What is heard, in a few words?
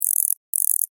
Insect Voice Chirp, Vocalization, Bug; Animal; Rattle